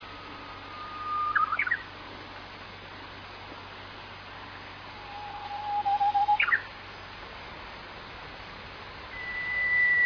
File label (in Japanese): uguisu